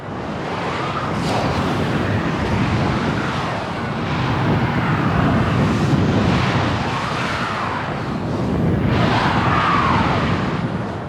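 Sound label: In Flight Loading Ambience; Liset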